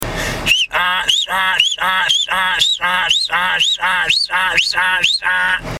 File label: Donkey Whistle